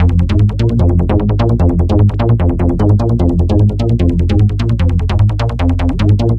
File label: Bass Loop